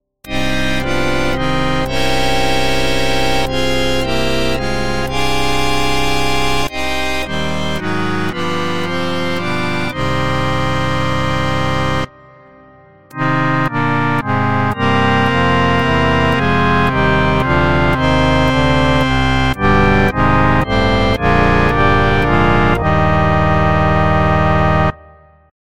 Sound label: Harmonium; Harmodion; Syntheway